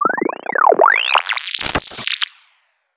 tuning

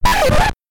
scratch

